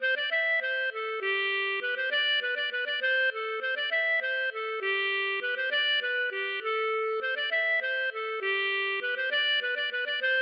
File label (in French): bourree Languedocienne